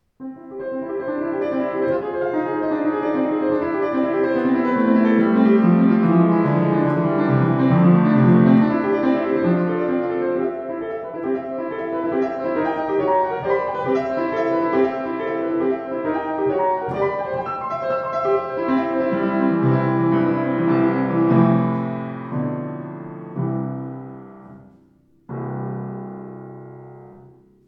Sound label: Sauter; 118 sauter r2 rr schwarz